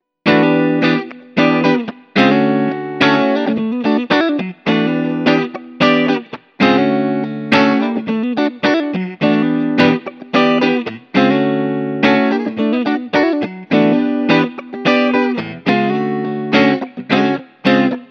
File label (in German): Digitale Gitarrensounds Fender 53 Bassman; Kemper